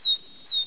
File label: D CHIRP